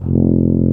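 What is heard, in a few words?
brass, C Tuba; Roland L-CDX-03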